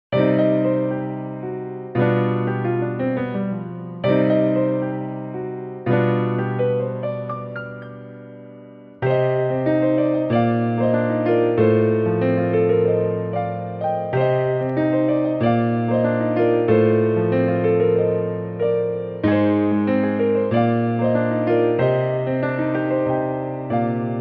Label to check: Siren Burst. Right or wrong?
Wrong